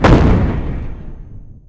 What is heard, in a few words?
shield; NanoFXv2; ExplosionFX; ExpShield